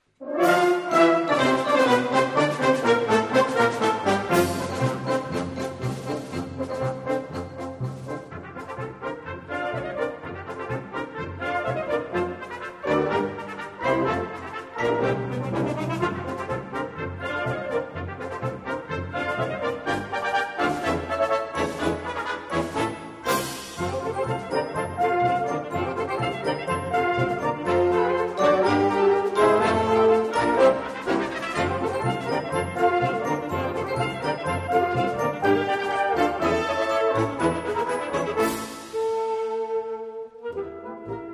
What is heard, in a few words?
Jazz Suite March